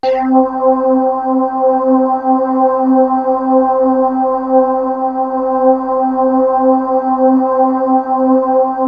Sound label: VOX ORGAN; MORPHEUS; Syntec; Wall of Sounds